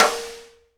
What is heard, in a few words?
snare RIM RING, Live Snares